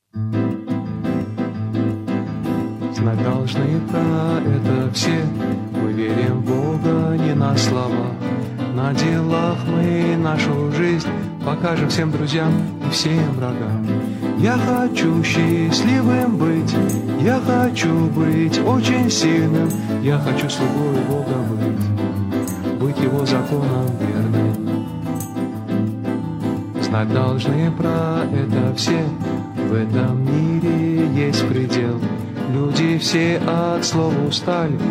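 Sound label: Song, Russian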